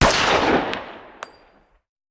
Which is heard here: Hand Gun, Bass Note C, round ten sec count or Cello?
Hand Gun